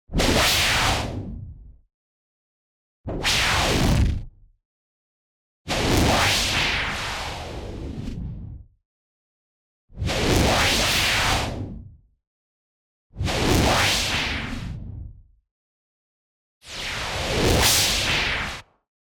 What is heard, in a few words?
whoosh, filtered noise distorted; boom library; Anime Essentials